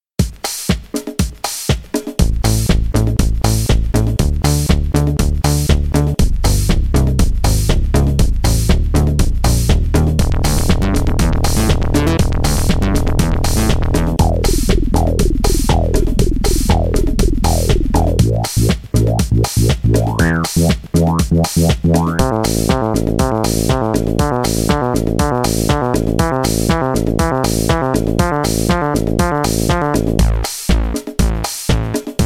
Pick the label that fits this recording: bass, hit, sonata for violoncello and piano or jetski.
bass